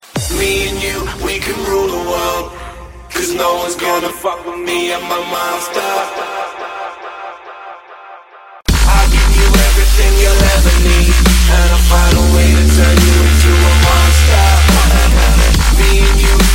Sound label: New Dub Step